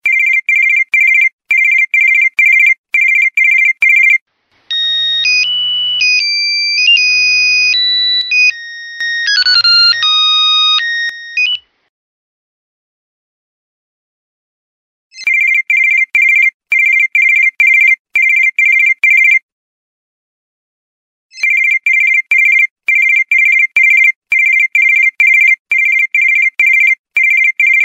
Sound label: Alarm Clock Sound